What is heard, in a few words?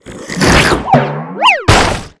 King Slime Skill